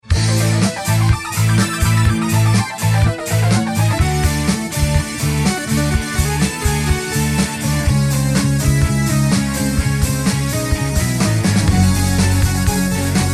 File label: lead sample Plugin Instrument